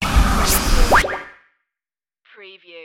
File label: stargate dimension suction